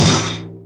drum and cymbal impact

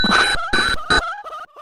jumpscare sound; fnati the revenge

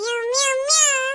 mew mew mew

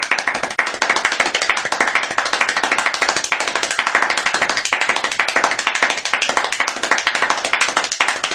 fast clapping; doug walker

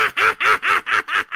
just a laughing dude; sr pelo

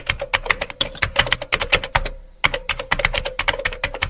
keyboard typing

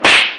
slap hard

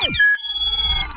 player spawn; among us

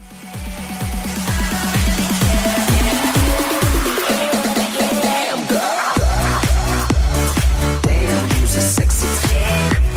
best beat drop

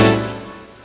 windows 95 error

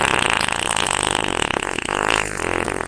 funny fart

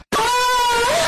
ahhhh girl

